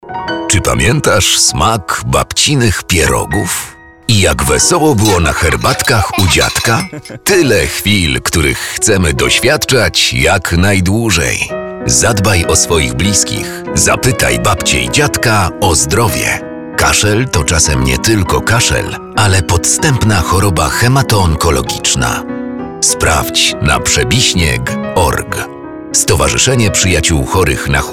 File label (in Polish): kaszel; Fundacja Chloniaki; reklama ogolnopolska